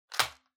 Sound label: Match Put